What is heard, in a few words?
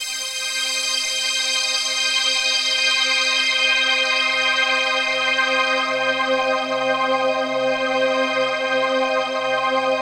PHASE PAD